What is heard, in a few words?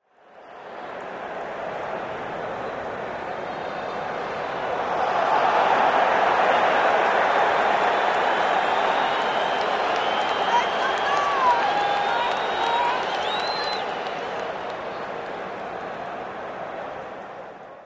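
Very large crowd cheers and screams with voices in foreground during a pro football game in an outdoor stadium.; Cheer, Crowd Crowds, Stadium Football, Crowd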